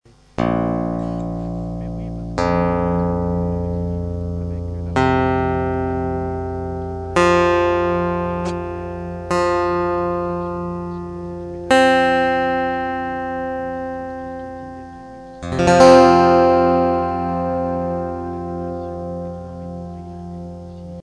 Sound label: tuning